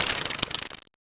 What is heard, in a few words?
Powerup Playing Card Trigger